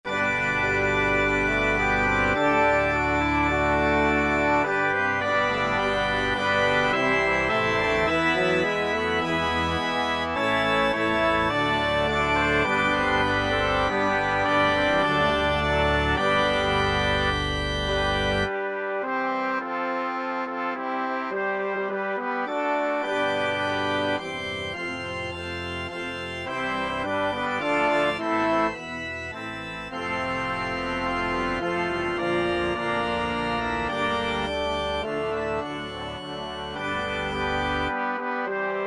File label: organ brass